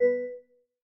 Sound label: Class Harp, notifications